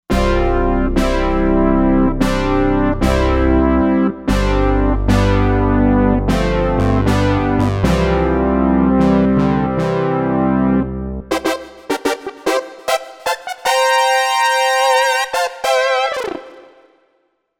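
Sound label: Jupiter, synth brass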